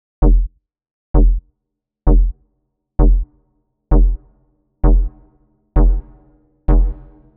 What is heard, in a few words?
Donks Stabs Seqs